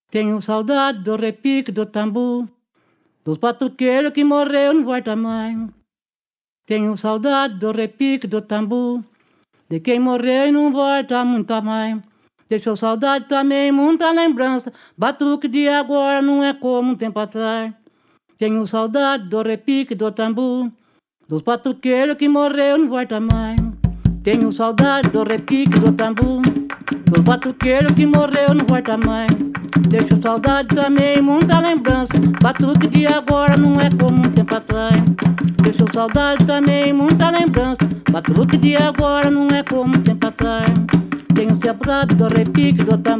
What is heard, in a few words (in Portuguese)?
Repique do tambu